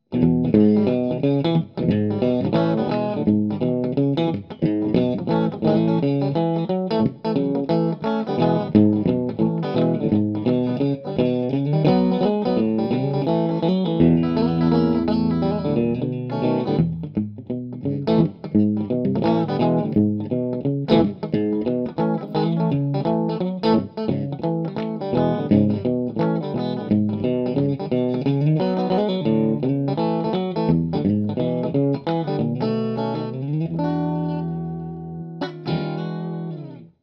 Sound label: blues